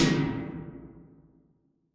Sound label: Tails, Drum Bits, Verb Tails